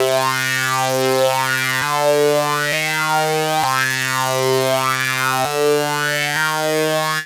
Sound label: funk, riffs & solos; Dr. Fink's Funk Factory